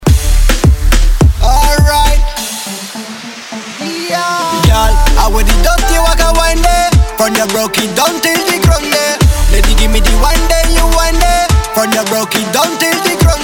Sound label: Tryout Hip Hop; schoolcheer